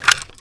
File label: clip out